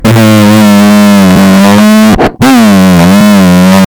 pain

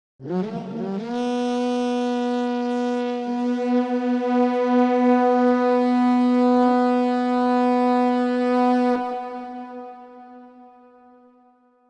horn sound; isengard

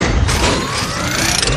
transform

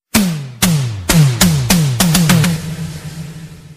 drum roll; eastenders

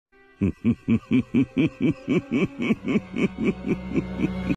laugh; doflamingo

